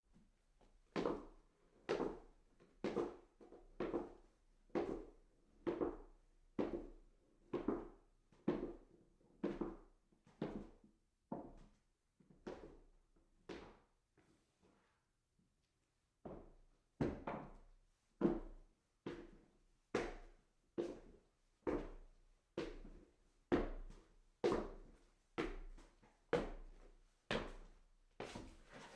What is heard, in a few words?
walk up stairs, foley